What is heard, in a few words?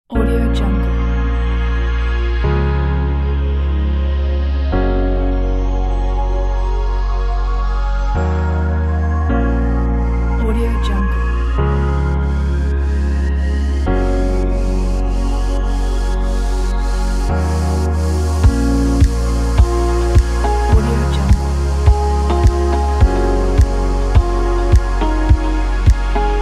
electronic ambient pack